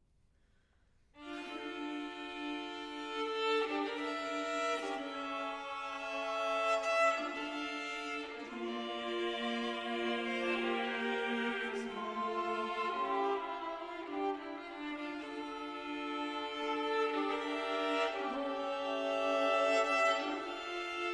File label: ciaccona with chorale themes; bwv 1004